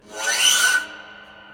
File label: Scrapes